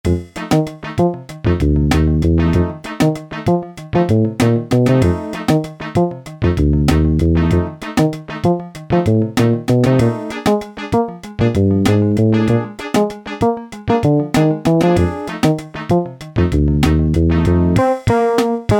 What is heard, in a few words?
RHYTHM & BLUES, Yamaha SHS-10; Factory style demos